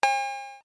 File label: ping